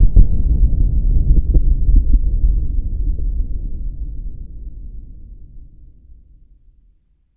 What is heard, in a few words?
dist explosion, ambient